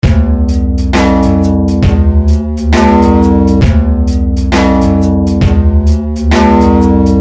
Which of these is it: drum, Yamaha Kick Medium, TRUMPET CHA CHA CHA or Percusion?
drum